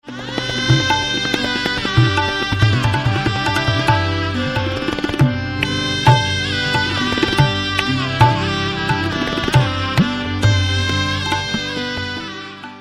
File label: Shehnai sample